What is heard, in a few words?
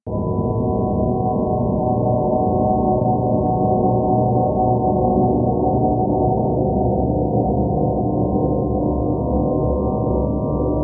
Disorienting Drone Ambience; Interior; Warframe; Orokin